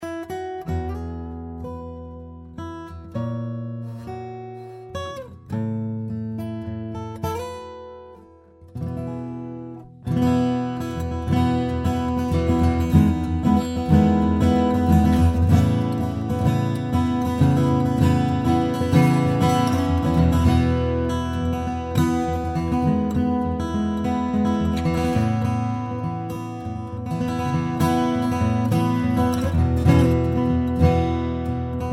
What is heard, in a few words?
guitar